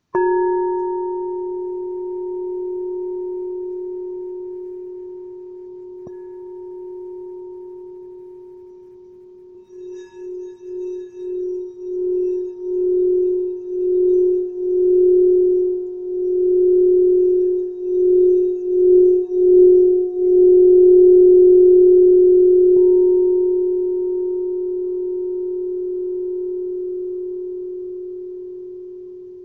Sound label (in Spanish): rojo 1386g fa, cuencos tibetanos